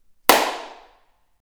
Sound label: Garage Clap